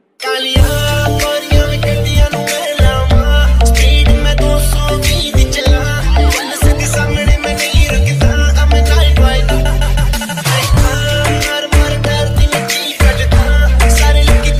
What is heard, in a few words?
remix ringtone